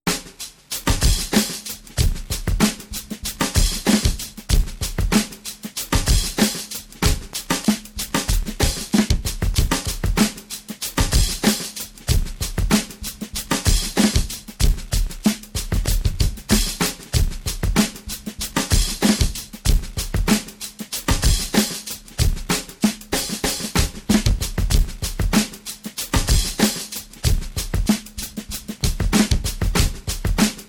Some Digitakt drum stuff; lots of fun to play

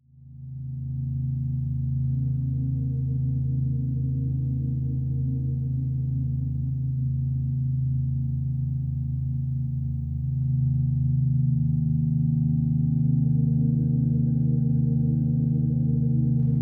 Sunday Subharmonicon tape droney thing

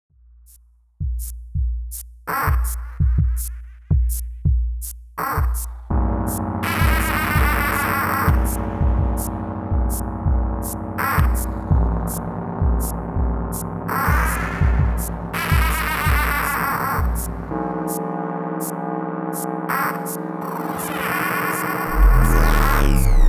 md, live beat; md encoders swapped, factory fresh control live beat

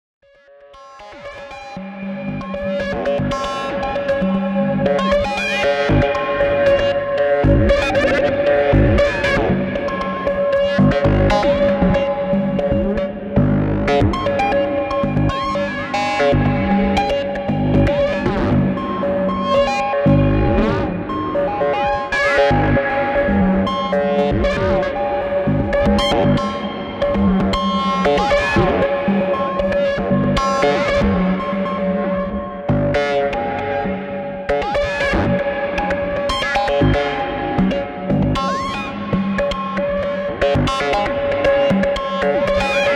Volca Modular / Fors Void

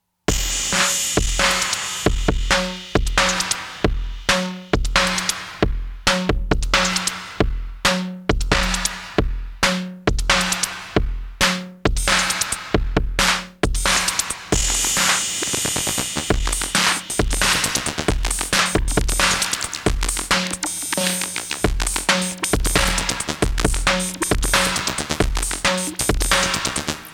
old live sound currently overly processed; max compression using dbx decoding on standard recordings; maybe md and rytm; so an old live sound currently overly processed. not sure what gear, maybe md and rytm.

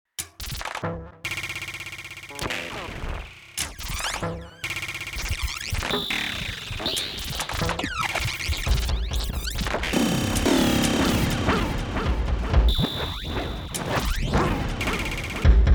A4/Ms20/Typhon/ju06

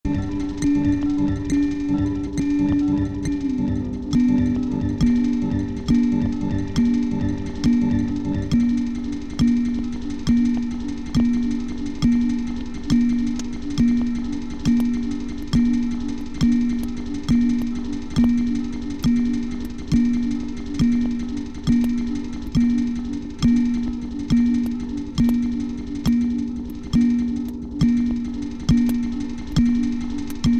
Analog keys + Volca Kick

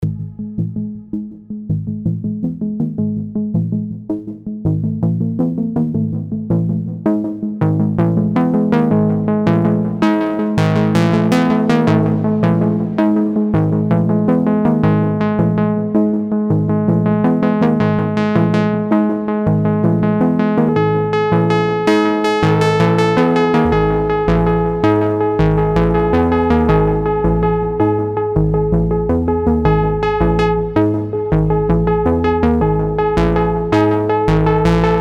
behringer system 35; FX and sequencing in FL Studio

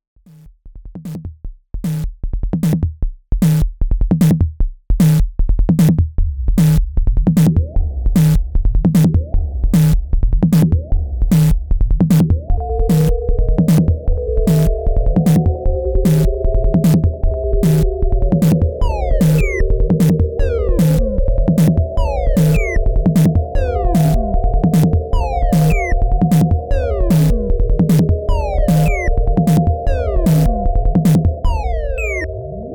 made with the M:C; made with the M:C, this isn’t recent

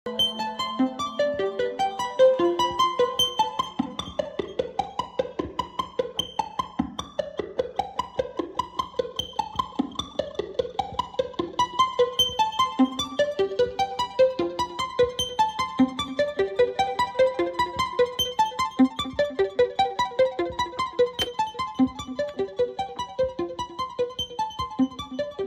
Analog Rytm Mk2 + Analog Four MK2; Ableton

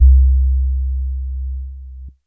a bass sound I recorded from my Digitone; It’s a bass sound I recorded from my Digitone; hip hop beat battle; loosely rock themed

